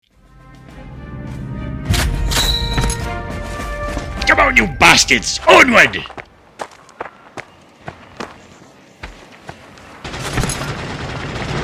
War Noises, Sounds of Battle: Soldiers at War (Strong language)